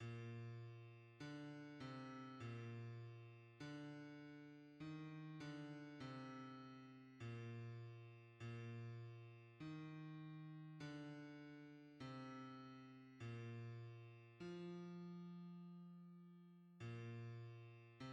hymns, bass; High in the Father s house above

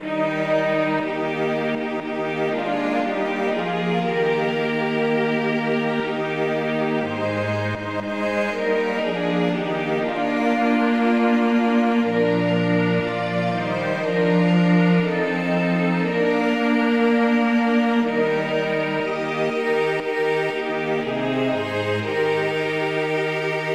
hymns

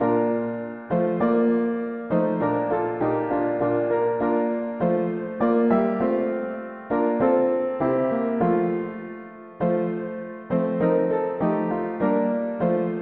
mono piano; hymns

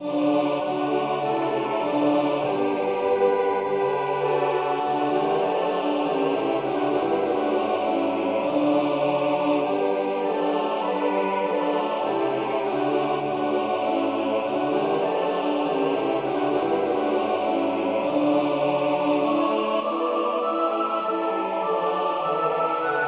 polyinstru, hymns; We adore Thee evermore

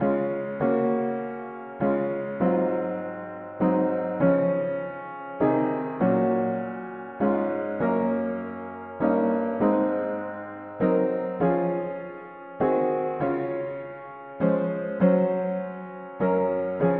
mono piano, hymns; Oh what a debt we owe

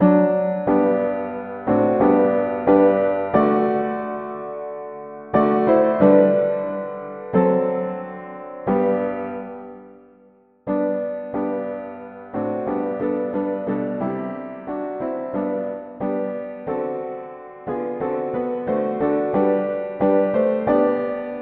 mono piano; hymns

